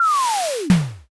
something falls

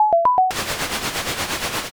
fax

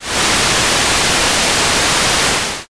rain

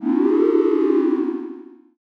ooh

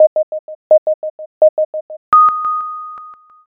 jiho

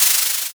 paper

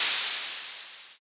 steam

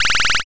fast forward

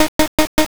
chew

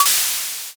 can open

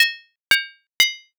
dishes

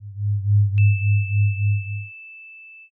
eerie ambient

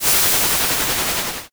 grill

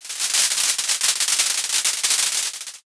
ovation

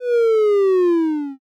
pico sleepy

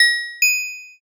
shop enter

